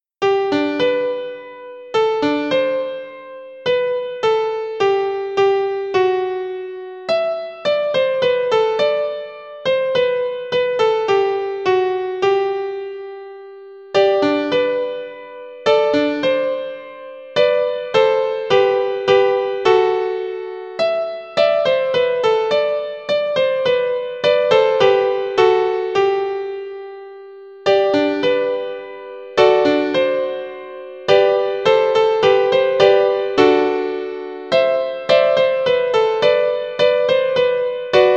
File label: Dona Nobis Pacem piano; Weihnachtsprojekt 2024